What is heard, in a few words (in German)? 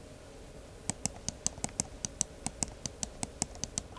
Maustasten